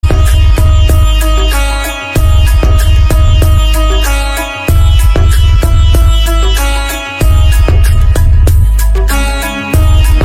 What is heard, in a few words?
instrumental; Lord Buddha